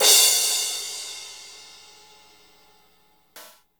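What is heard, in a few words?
cymbal crash; Best Service Gigapack 2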